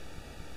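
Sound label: Farfisa Clarinet Noise, organ; Roland LCDP09 Keys of the 60s and 70s 1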